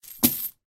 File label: money coin belt drop siller